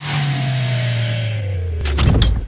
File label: unload, dropship; StarCraft v2.2.2; terran units